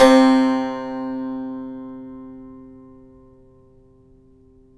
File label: saz; Roland - LA Composer vol. 3